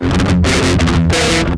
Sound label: JCM900 Metal Triplets; IDMT